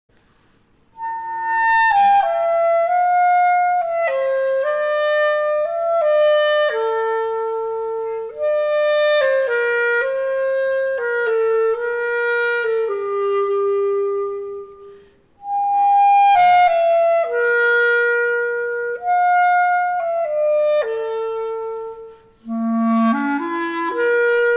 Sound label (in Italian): Clarinetto